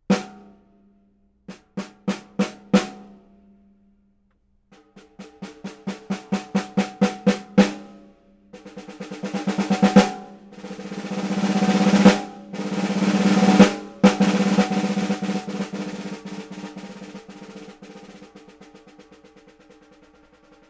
Oak SS 6.5; Crav Oak Bird; Namm

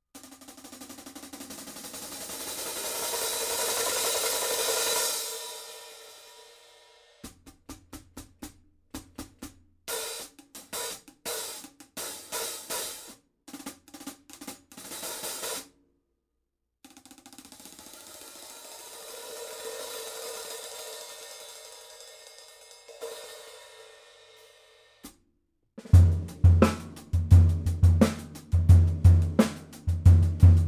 Paiste 14

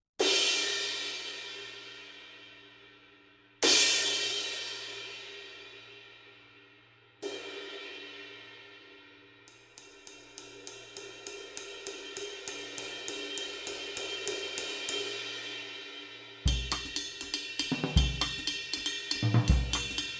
Sabian 22 Art

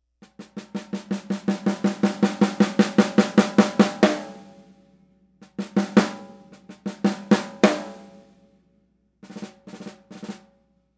WFL 6.5x14 WMP, Ludwig

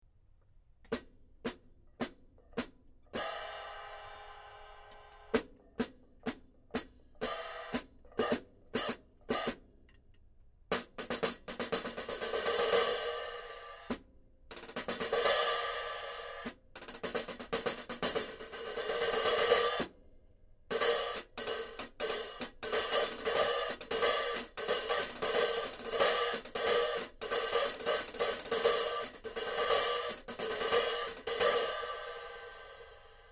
Ist K Trans A 12 HH

